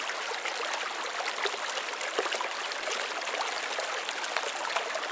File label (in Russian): nebolshoj vodopad v glushi lesa